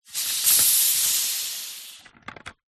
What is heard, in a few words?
household water pressure spray bottle release air